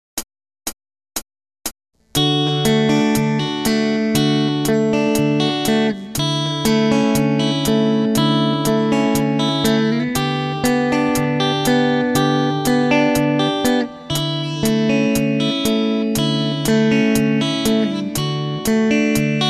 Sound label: Rhythm Guitar